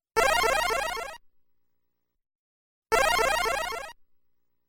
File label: Hero Used Magic Sound; Kaijuu Densetsu The Tale of the Shell Monsters